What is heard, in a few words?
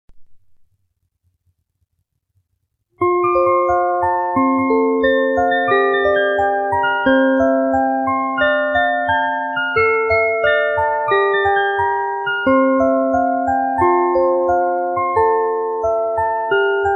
Music Box; Abiko City Song